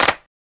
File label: hand clap g key, Loudest frequency: 2219Hz; percussion; single hits one shots